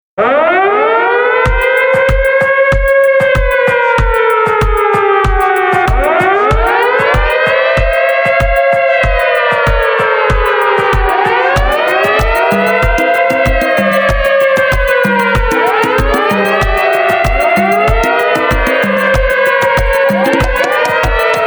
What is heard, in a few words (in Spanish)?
Sirena Reggaeton